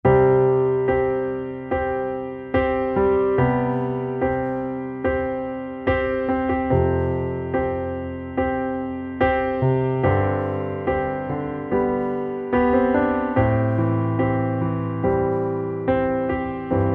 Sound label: Underscore PIANO; Worship Flow